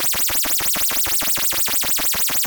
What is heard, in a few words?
Pulsing, Keyboards